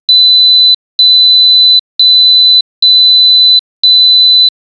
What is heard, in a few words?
electric beeping